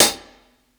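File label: 60s HH medium; ONE SHOTS; HI HATS; breaks; Basement drums vol 2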